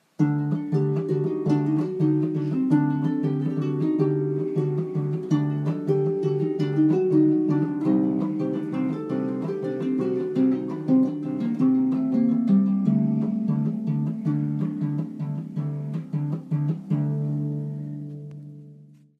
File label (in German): Klangbeispiel, Harfentreffen